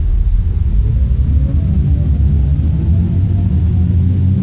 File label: eng revs up